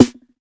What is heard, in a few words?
tight steel snare drum b key